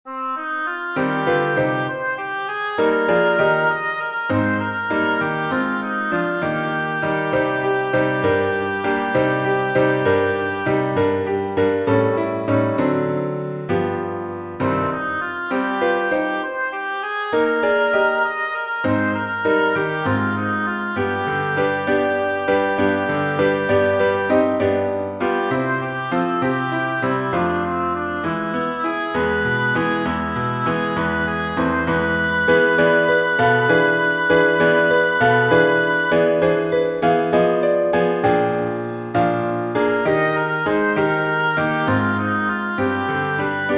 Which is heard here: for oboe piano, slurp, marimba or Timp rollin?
for oboe piano